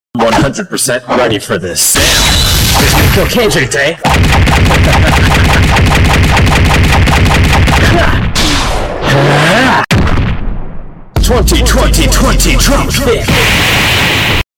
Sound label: dropkick